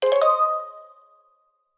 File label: Calibrate; Zelda